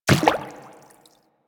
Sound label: Infested Medium Run Water, footsteps; Warframe